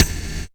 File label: percussion, Dance Synths; Mad Kit